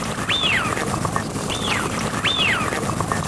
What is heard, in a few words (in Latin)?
anas penelope